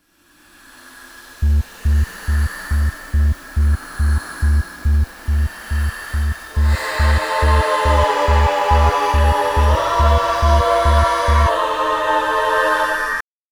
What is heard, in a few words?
bgm